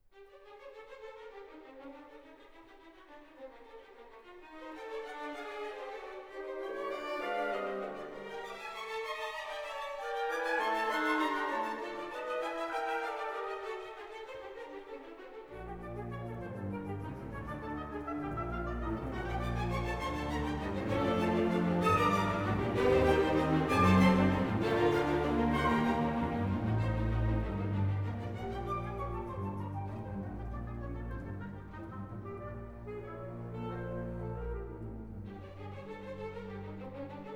Enigma Variations, for orchestra, Allegro; Elgar

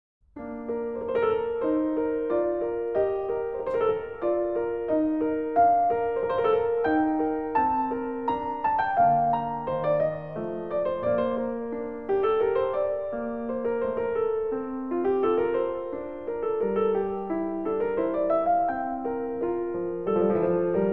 keyboard; Bach; Partita for keyboard No. 1 in B flat major, BWV 825; Praeludium

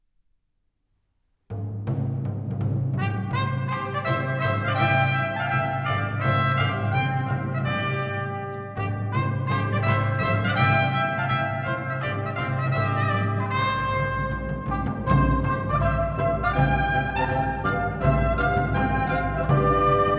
Fanfare; Tous les matins du monde [Bande Originale du Film]

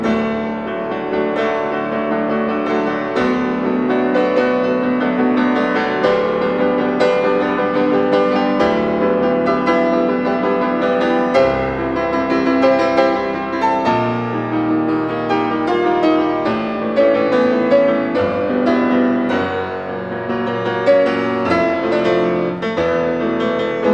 Sonata for Piano in B minor, Grandioso; Debut Recital

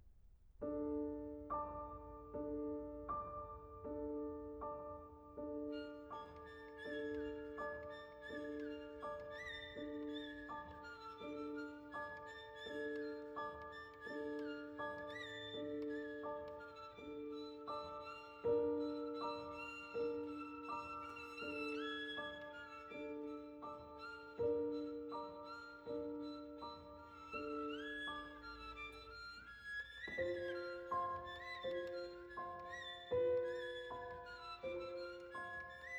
Romanian Folk Dances, Stamping Dance- Andante

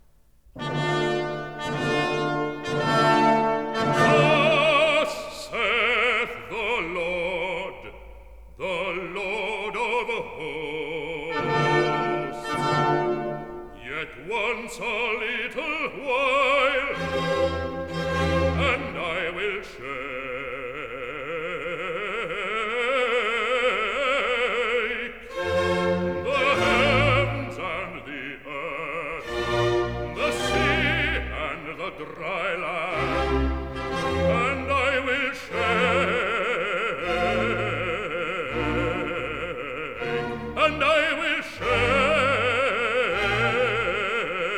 Recit (Bass); Thus saith the Lord of Hosts; Messiah

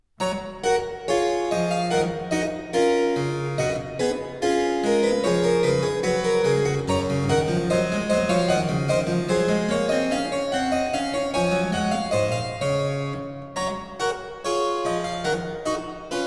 French Suite, for keyboard in G major Gavotte; BWV 816 (BC L23); Bach; The French Suites

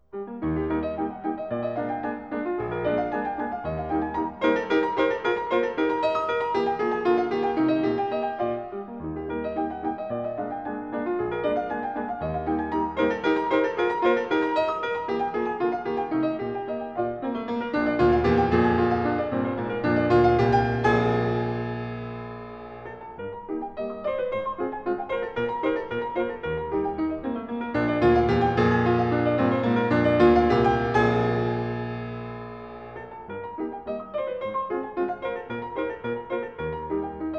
Variations and fugue for piano in E flat major; "Prometheus"; "Eroica"; Beethoven

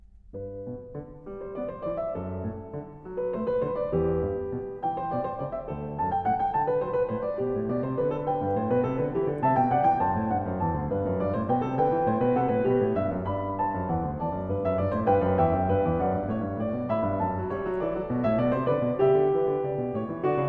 Variation, Canon on the unison; Bach; Goldberg Variations, BWV 988

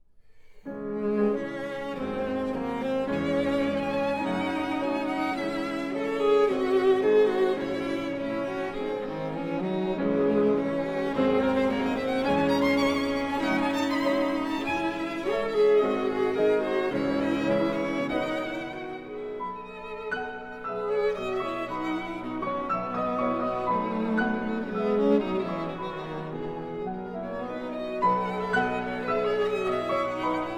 Trio for Piano, Violin and Cello in A minor; L'istesso tempo (Allegro moderato); Deutsche Grammophon

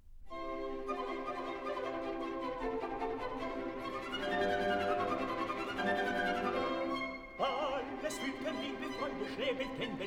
opera

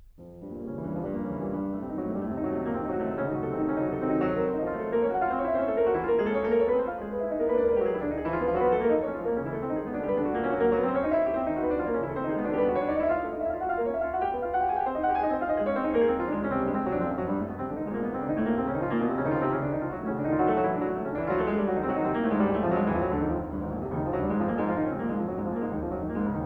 Piano Sonata in B-flat minor, Finale Presto; Chopin

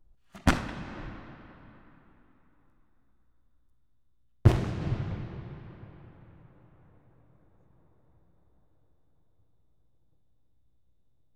Aerial Explosion Left; Telarc Sampler, Vol 5

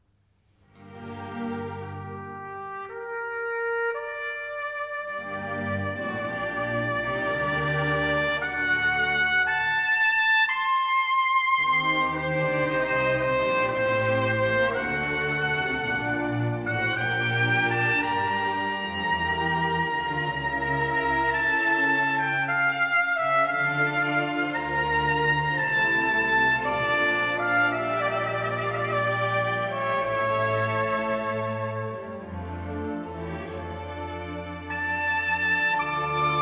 Recorder Sonata in D minor, Adagio; Music for Trumpet

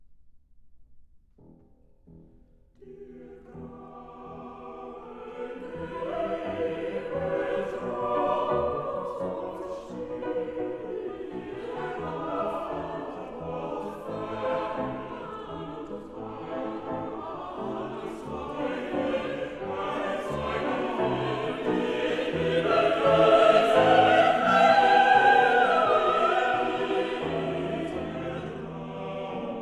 Quartets for mixed voices & piano; Brahms

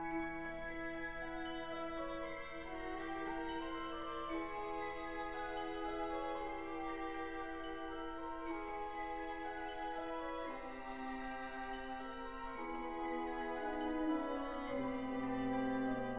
symphonic poem; Fontane di Roma (The Fountains of Rome); 4. Villa Medici Fountain a; Respighi

